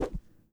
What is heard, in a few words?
Swing Cane